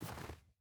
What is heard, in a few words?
footstep rubber boots snow walk; Rust